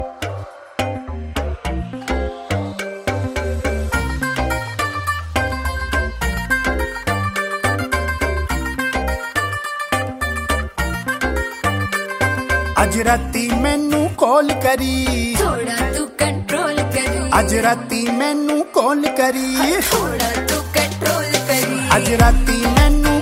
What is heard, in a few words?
hindi ringtone